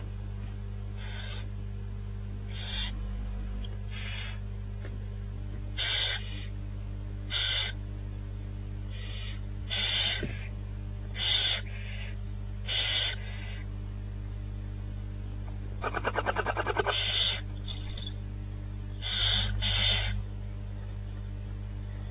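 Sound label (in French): nourrissage, chouettes, nichoir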